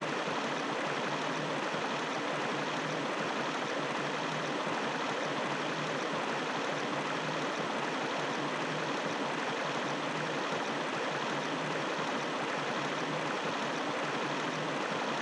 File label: ambient tunnel; uzen tunnel